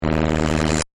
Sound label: Static Voltage, Production, Element, Static, Voltage, Buzz; BlastWave